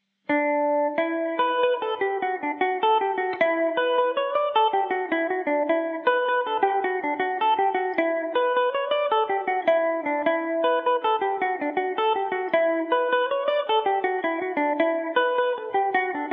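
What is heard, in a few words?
E dorian revelation, Irish mandolin; basket of turf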